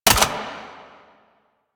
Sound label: Sniper Mech React; DE; Warframe; Frumentarius; Exalted Sniper